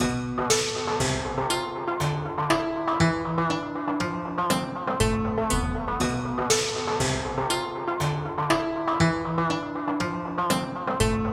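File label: altered piano, feedback echo; Preset: Don't Call Me Basic; Newfangled Audio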